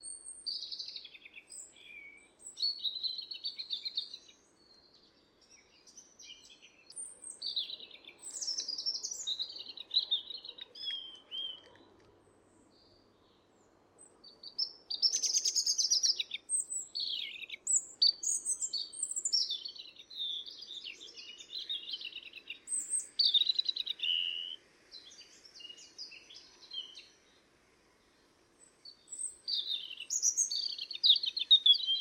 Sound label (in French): rougegorge familier; chant